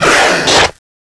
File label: claw attack; rheptor